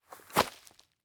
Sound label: Foley Footstep Boot Single Step Left On Leaves; Foley Footsteps